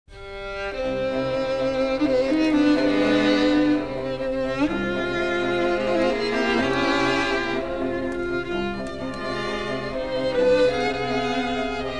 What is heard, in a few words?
strings; beethoven